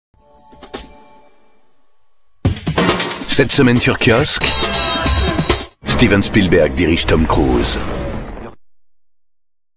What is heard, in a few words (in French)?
jingle cette semaine; kiosque